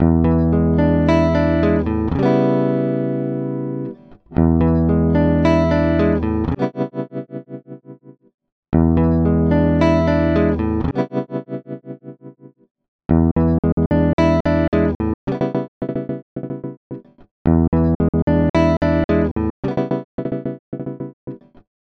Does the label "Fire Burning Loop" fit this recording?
No